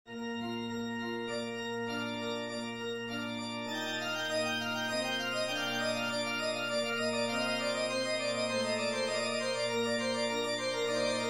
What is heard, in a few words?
toccata in f; martini giovanni battista